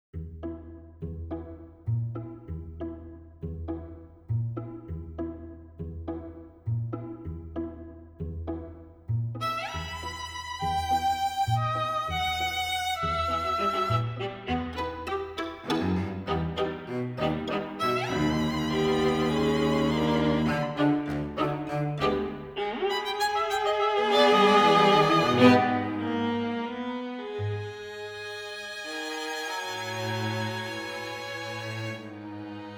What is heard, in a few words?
Micro Quartet, Symphonic Orchestra; EW-QL Symphonic Orchestra PLAY Edition